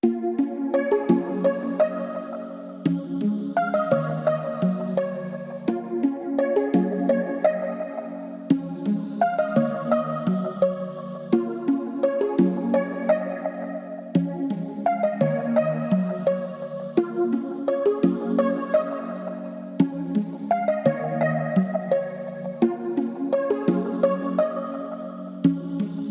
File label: Bells